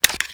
reload fast empty mag out; gmod; pi mike

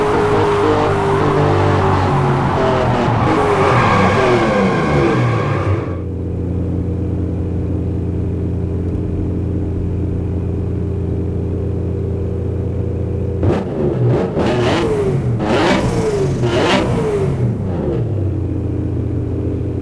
throttle off, vehicles

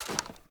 reload empty magout arm, weapons; gmod; viper

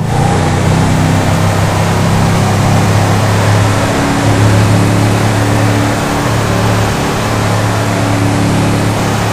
fourth cruise, vehicles; gtav

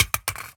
phys mag drop smg poly concrete, magazine drops

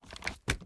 reload fast start; reloads; gmod

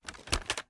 reload empty fast xmag end; gmod

